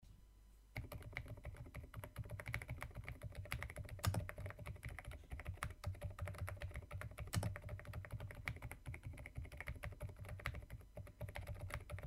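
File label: switch, keyboard; epomaker sea salt silent v3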